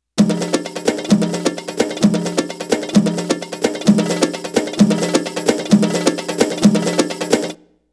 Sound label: Arabic Rhythms